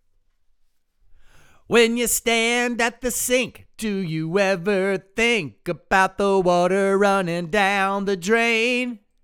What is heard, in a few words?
vocals